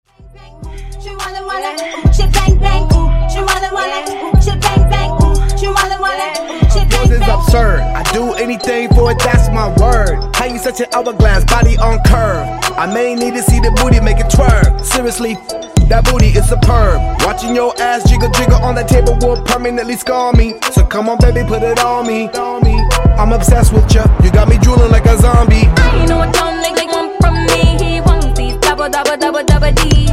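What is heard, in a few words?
Acapella Intro Outro 105Bpm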